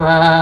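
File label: Chamberlain voice; AKAI S6000 CD-ROM - Volume 1; SYNTHESIZER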